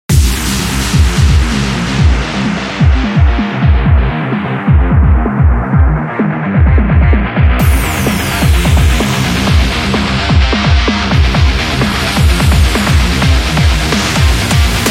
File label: Energy Sport Rock